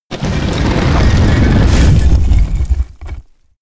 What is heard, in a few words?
stone gate open